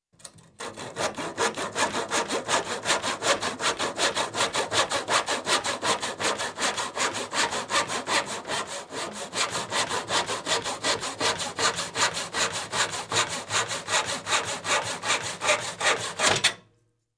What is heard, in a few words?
Tenon Saw, Small Piece Of Wood; Construction; BBC Sound Effects Library